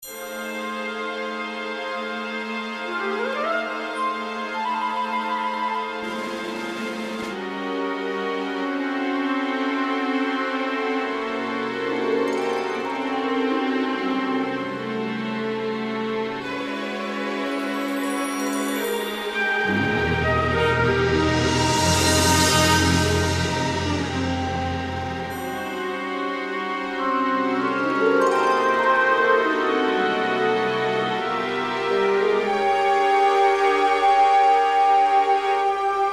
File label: advanced orchestra; peter siedlaczek